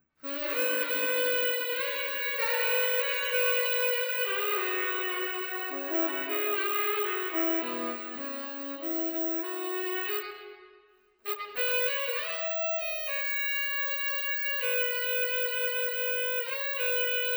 Sax

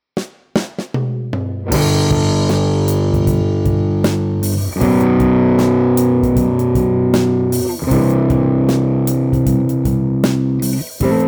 Rhythm Guitar Lead Guitar; Tweed Deluxe

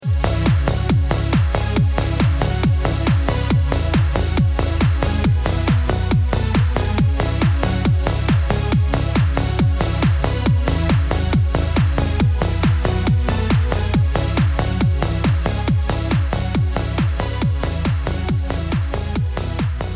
Trance Lead DRY

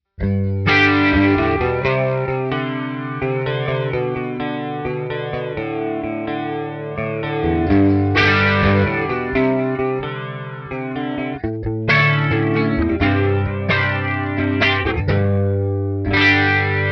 Rock TwinR Flanger, guitar; Rock – TwinR – MissTress Flanger; ReTube Custom Shop

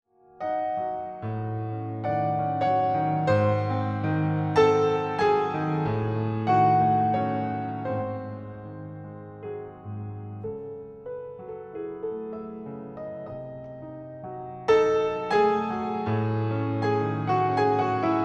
Piano Hall; Verbtone

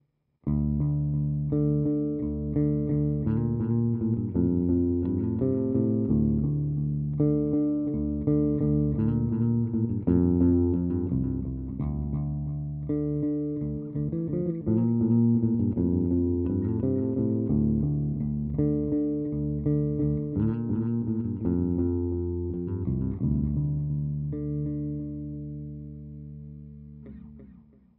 BASS Delay

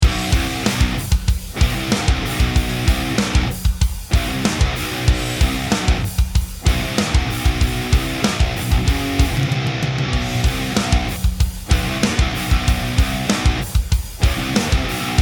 New Metal Plate Reverb WET; Verbtone

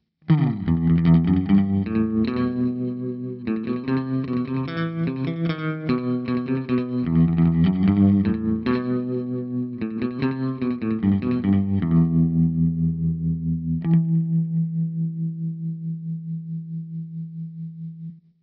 Surf Rock Tremolo Delay Reverb; guitar; TwinR; ReTube Custom Shop

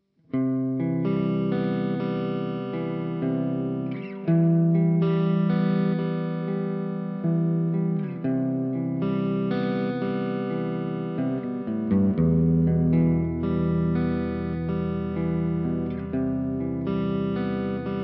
Overdrive Reverb; TwinR; ReTube Custom Shop

